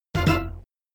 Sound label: Bonus Add; Indiana Jones The Pinball Adventure; Williams; arcade